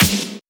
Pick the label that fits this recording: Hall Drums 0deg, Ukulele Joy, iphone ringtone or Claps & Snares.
Claps & Snares